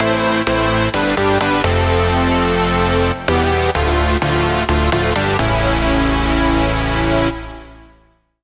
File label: Chord, Sylenth1; Chord - Etheric Harmonies; Routed Sound; Sylenth1 Expansion - Star Scavenger Vol.1